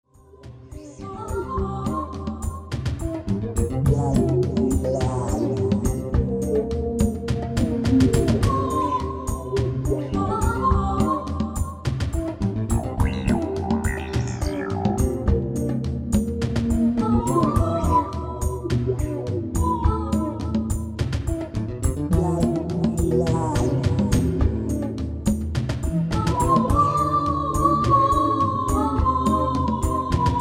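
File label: Shamanism, Asian Ethnic Sounds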